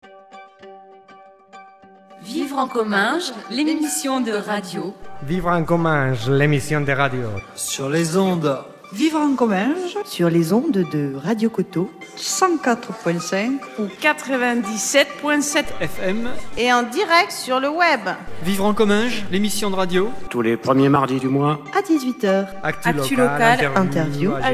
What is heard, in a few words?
Jingle; Vivre en Comminges